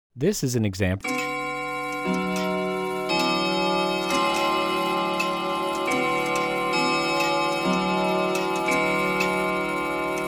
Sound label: morph; fftsplice